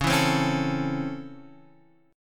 Db Minor Major 7th Sharp 5th Db down Guitar Half Step Down